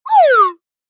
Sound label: notification; samsung Aleart